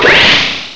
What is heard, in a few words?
SCARE; Carmageddon